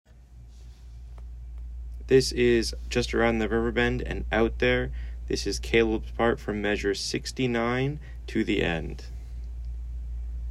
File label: Piano Only; Momentum Performance Group